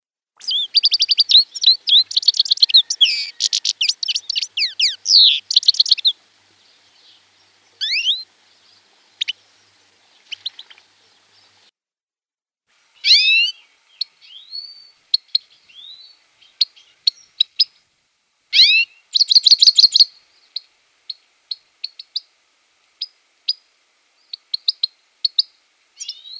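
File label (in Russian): SHHegol sedogolovyj, grey-headed goldfinch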